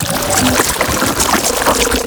Tentacle Flail Loop; Warframe; Abilities

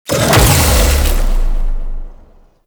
Embrace Shockwave Release; Warframe; Broken Frame

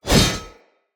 Whoosh, Swords; Weapons; Mockery; Mockery Stultion

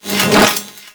Projectile Whizz By; Enemies; Abilities; Warframe; Sentient; Brachiolyst

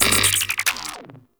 Puzzle Fail UI; Kahl Quest; Warframe; Veilbreaker

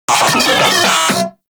Moa Vocal Enemy Spotted; Warframe

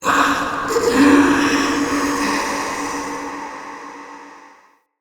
Ghost Soldier Death, Vocals; Enemy; Wandering Ghost Soldier

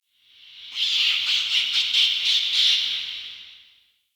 Ghost Rat Chatter; Ambience; Soul Plane; Witch Station Ruins

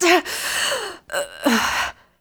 Breath; Fight; Cinematics; Warframe; TheNewWar; Throne Room

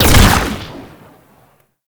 Concrete Archgun Shot; Warframe; Archwing

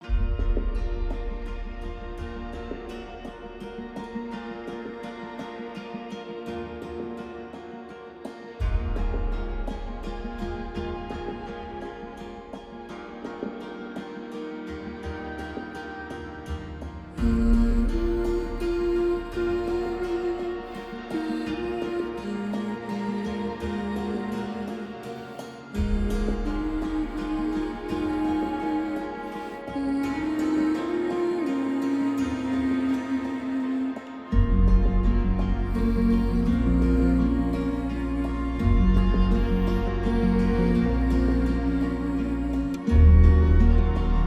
Character Selector UI Menu Music; Mother

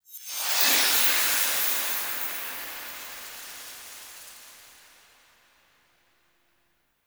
Intro Portal; Ballas Lotus Operator Intro; Warframe; The New War; Cinematics

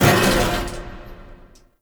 Vent Exit; Fortuna; Warframe; Venus Open World; Venus Solaris United

